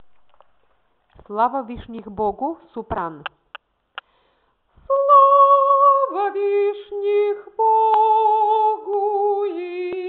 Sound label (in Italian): soprani